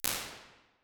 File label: NICE MIDDLE ROOM; Mark Williams